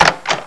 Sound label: Door Open; Odyssey